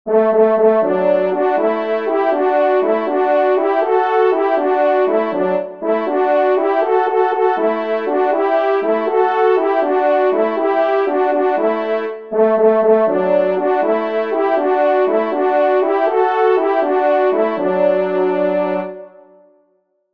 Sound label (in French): Cerf Discrete Duo; Fanfares imposables en Concours